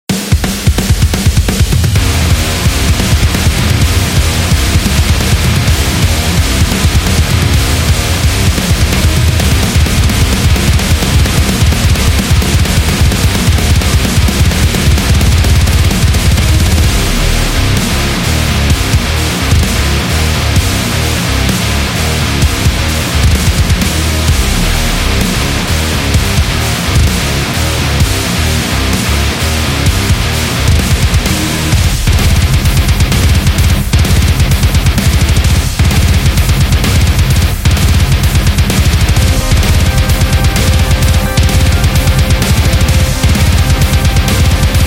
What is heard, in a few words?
Death Metal